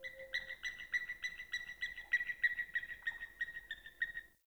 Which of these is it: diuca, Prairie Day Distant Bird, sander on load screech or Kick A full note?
Prairie Day Distant Bird